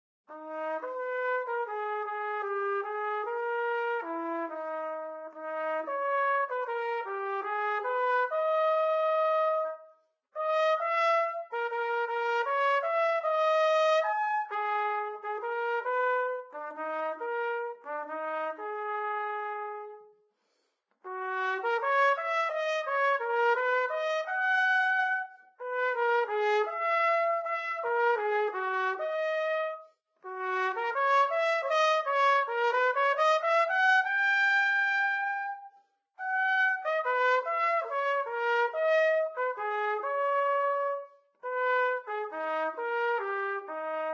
Trumpet Romance; Instruments

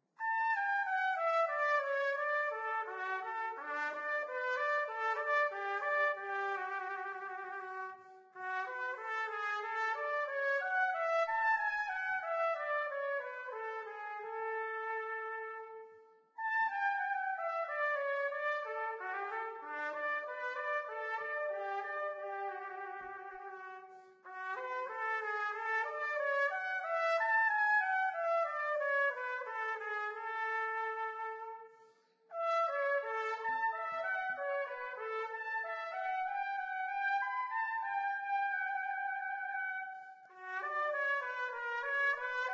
Trumpet; Zomboid; Lifestyle

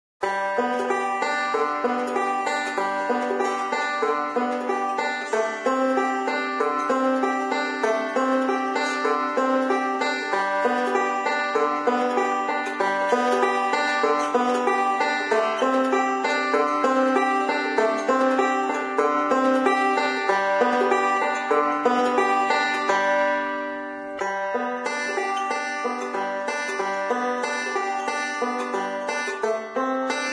Banjo Simple Rolls; Instruments; Zomboid; Lifestyle

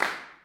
electronic clap; DJ Booth; effects

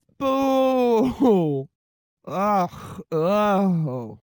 Woman Booing; Zomboid; Lifestyle

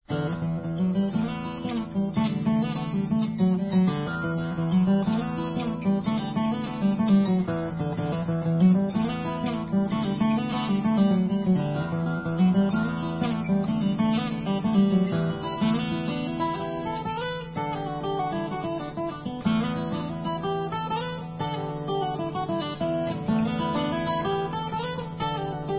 Guitar Acoustic Celtic Tune; Zomboid; Lifestyle

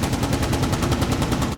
30mm cannon, gunfire; Helicopter

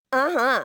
Woman Dance Happy; Zomboid; Lifestyle

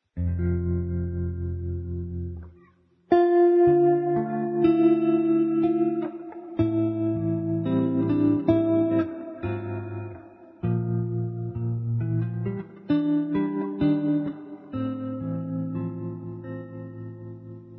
Guitar Electric; Instruments

